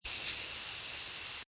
Air hose spray short. Short burst of air release from air pressure can; Aerosol Can Spray; Cleaning; Cleaning Sound Effects